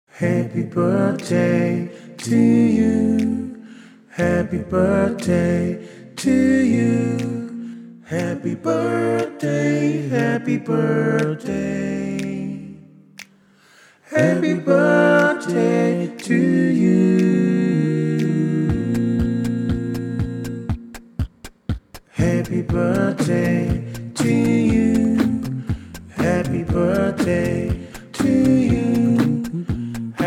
Happy Birthday Song in English Beatbox

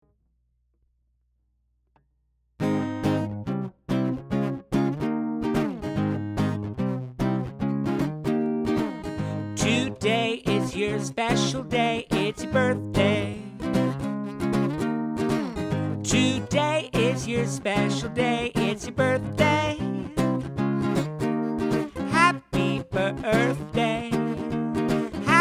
Happy Birthday Song in English Guitar

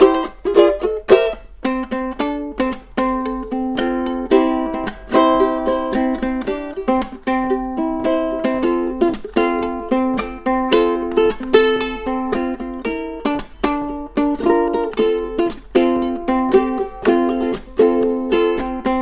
Happy Birthday Song Played on Ukelele

